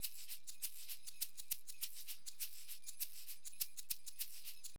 shaker; LiveAct Pool 1

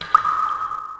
Drip; PC Gamer IT CD 38; thief

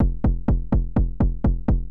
kick, house, 125, drum; eJay

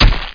hit hurt; weapons; Hexen 2

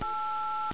dial8; Image Bonanza; Expert Software; ondajob

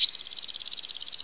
CLICK

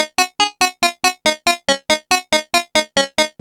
Bellhop - A - 140, Synthloops; Looperman; makingwaves

